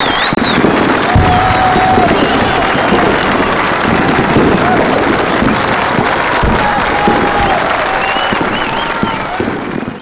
Win Effect

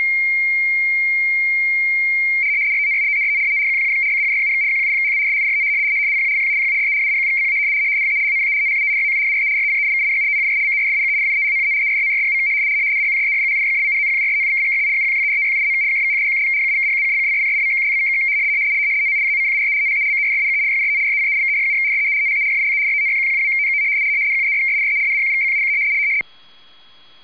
rtty 110, rtty 170

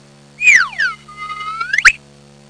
HI SLIDE, WINDS

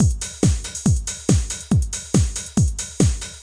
beat

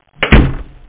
Leaving Chat; M-H.Settings 2.0

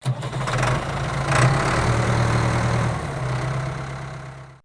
TRACTOR; zones; agri

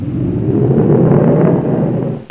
blowing, nature; Sound Effects for Multimedia - Volume 1

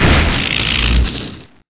light hit; Hexen 2

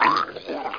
DROWN; Quake; player

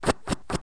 ARROWS; Warlord3

